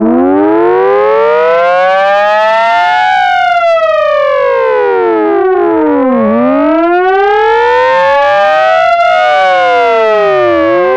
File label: civil defense alert siren